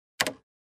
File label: Button press on cash register